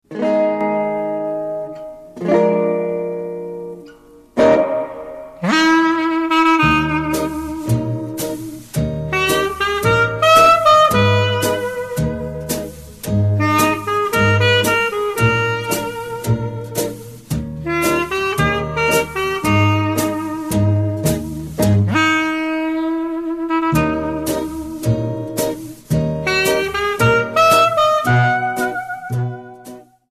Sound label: Jazz Band